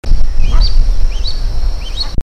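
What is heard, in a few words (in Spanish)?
pato cutiri, Amazonetta brasiliensis, Brazilian Teal; cantos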